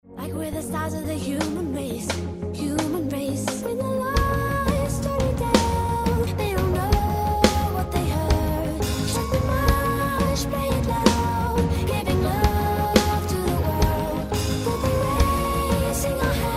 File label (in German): PreChorus mit Musik